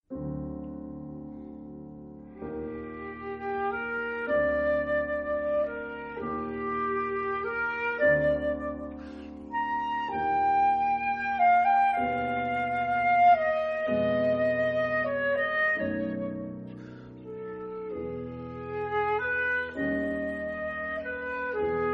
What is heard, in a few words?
Nocturne flute piano